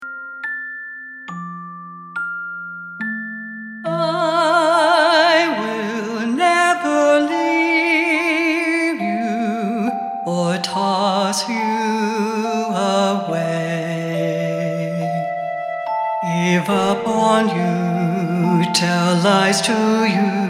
Sung Line I will never

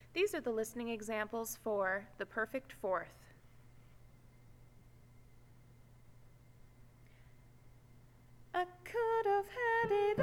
perfect 4th

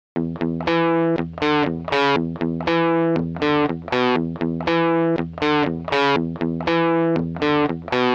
Dorian Mode; Guitar rhythm part using the Dorian Mode

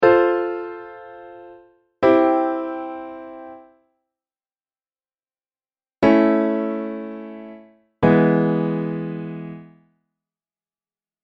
cadence examples plagal; musical-u

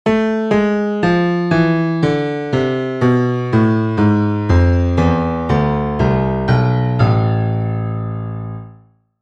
Minor Scale

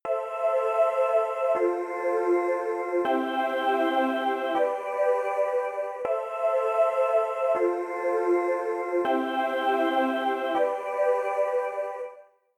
CHORD; song-writing

